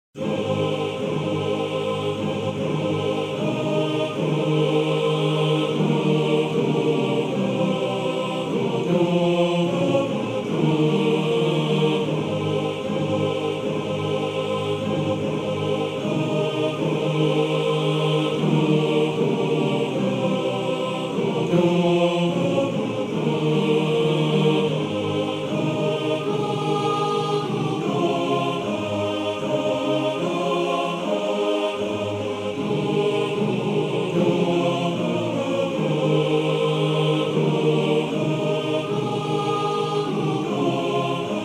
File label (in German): Chor; Wohin